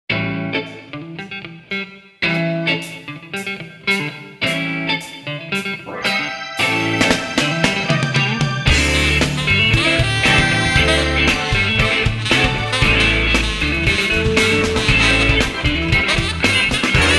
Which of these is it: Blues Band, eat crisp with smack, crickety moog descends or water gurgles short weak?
Blues Band